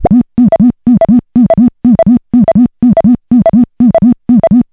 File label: electric, special FX twisted; USB Soundscan; AKAI